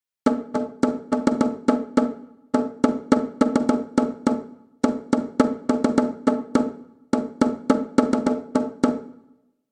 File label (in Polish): bebenek; Nagrania Lokomotywy